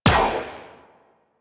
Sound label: Guard Turret Rifle Fire; Warframe; Venus; Venus Turrets